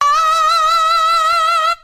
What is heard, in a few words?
ahhhh; Notes